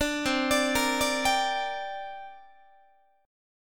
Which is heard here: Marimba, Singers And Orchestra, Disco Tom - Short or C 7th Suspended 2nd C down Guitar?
C 7th Suspended 2nd C down Guitar